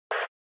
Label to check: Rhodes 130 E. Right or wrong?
Wrong